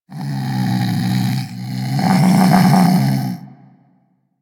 Vocal Snore A; Warframe; Hunting Critters; Vulpaphyla

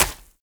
Flock Attack Hit; Powers; Fey

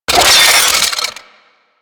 Drone Blade Jump; Weapons

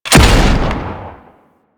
Thumper Bot Rocket Shot C; Warframe; Grineer

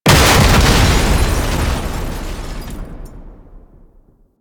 Rocket Launcher Explosion; Weapons; DE; Warframe; Tenno; Lasrian

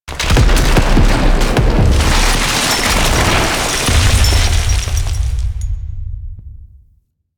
Mech Entrance Rock Debris

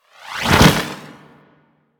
Idle Wing Flap; Dragon Pelt; Warframe

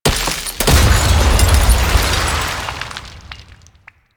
Glass Explosion Medium; Warframe; Grineer Ocean

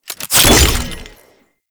Grenade Throw; Warframe; Veilbreaker; Kahl Abilities

